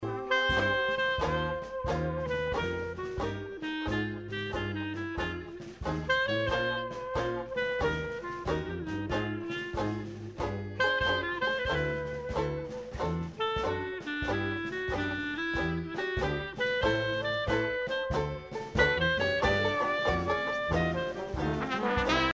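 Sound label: clarinet solo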